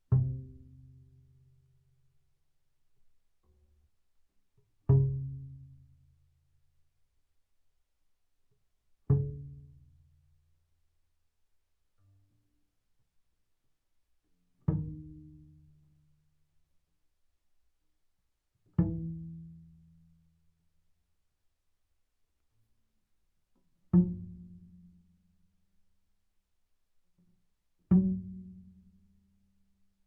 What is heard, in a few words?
Bass pizz sul A ff C3 A3, double bass